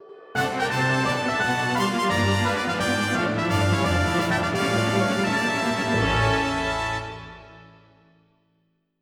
Result Win Rank Up

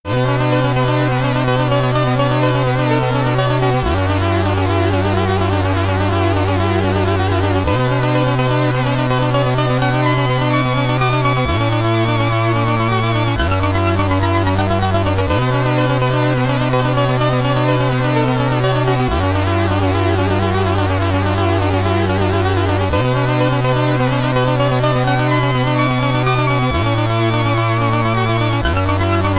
High Score Tune (SID Stereo); Dynamix; Commodore 64

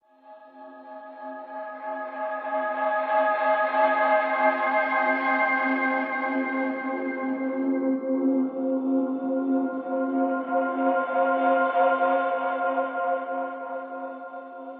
Success Tonal A4; Insanely Twisted Shadow Planet

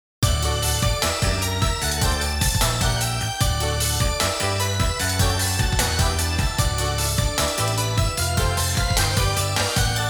Result (Win) [Victory]

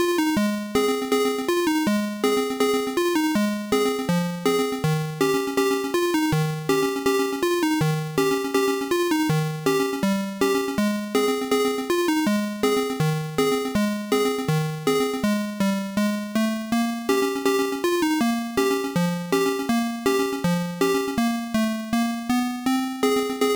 Taiko Performance Song

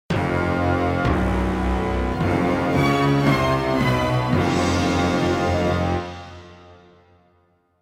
Fanfare; Godzilla Save the Earth

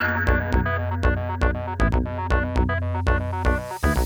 Intro - 118 bpm

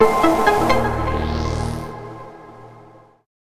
Puzzle Solved Jingle; Metroid Samus Returns

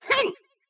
slash; Mystical Ninja Starring Goemon; sasuke

